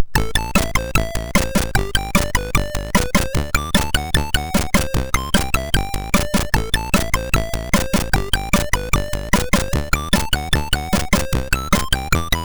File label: sid, MOS6581R2